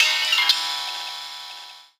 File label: RAW JAZZ; Rawcutz; Nu Forms